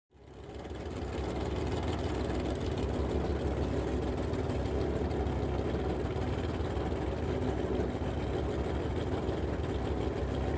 Drag Boat Start idle

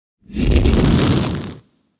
Flame Thrower Burst; exterior

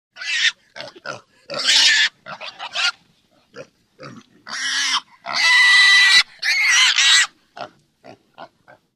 Pig Squeal Multiple

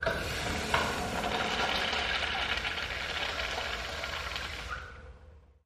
Public Urinal Flush; toilet

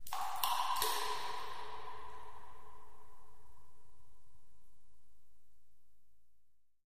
Echoey Cave Drips Wa

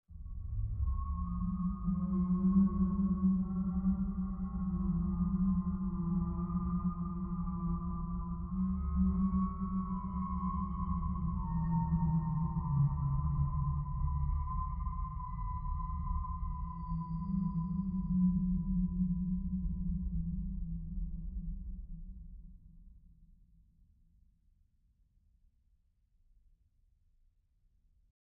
Underwater Amb Metal, metal groan atmospheres; Underwater Ambience Metal- Ambient Metal

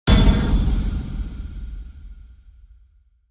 Impact HF Metallic Ta

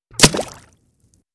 Bullet Impacts Water

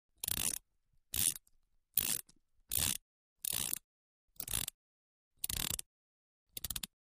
Ratchet wrench tightens bolts.

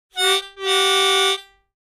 Mouth Organ Car Truck, harmonica; woodwinds

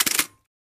Antique Camera Shut, shutters; shutters & movement

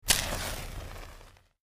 Match Strike Quick

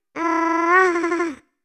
Female Robot Laughs, servos

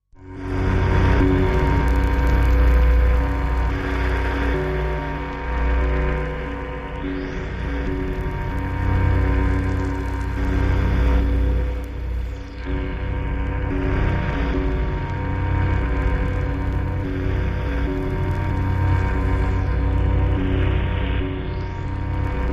Hydro Pad Lrg Generator, eerie ambience

